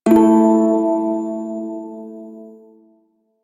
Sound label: Prime Shawzin Chromatic Chord J; Music; Warframe